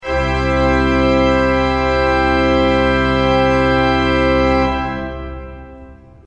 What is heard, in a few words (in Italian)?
tutti; srednja vas